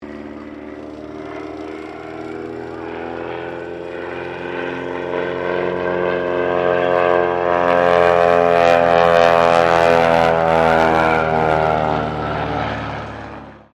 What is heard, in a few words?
AIRCRAFT CESSNA O-1 BIRDDOG PASS; Cessna Birddog spotter aircraft; Airplanes (Sound Effects:Vehicles)